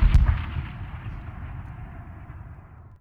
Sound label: distant gun; WAR